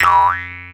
Jaw Harp; musicradar; cartoon caper

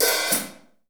HH 1O3 R, DRUMKIT

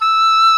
SOLO WINDS

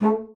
A3, bassoon; Xsample VOL-6; C BASSOON

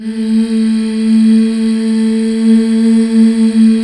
MMHH A3

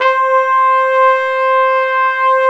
WND FLG SO, Hybrid Winds Flugel & Sop

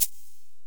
Korg x5, Drums

